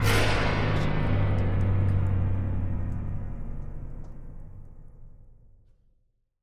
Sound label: piano fx hit; soundscrate